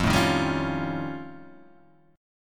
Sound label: F Augmented 9th F, chord; strummed; {1 0 1 0 2 1}